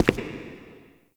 Shadow Figure Steps

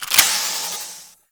Experiment Pistol Reload, Weapons; Warframe; Corpus; Sent Experimental Pistol

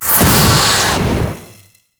Swarm Tear Dissolve; Entrati

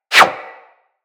Bullet Parry Metal; Impacts; Bullet Parries

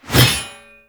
Heavy Scythe Whoosh; Warframe; Duviri Heavy Scythe

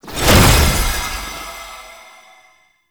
Companion Resurrect; Warframe; Tenno

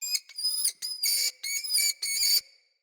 Day Close Critters

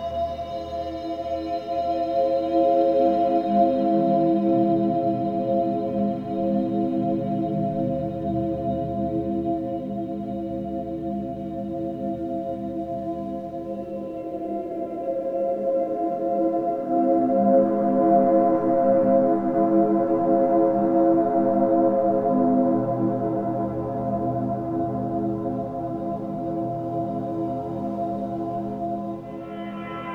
Bed Loop; Warframe; Shadowgrapher; Interior

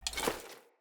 Short Sword Recall; Weapons; Player

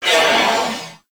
Purgatory Warrior Death; Warframe

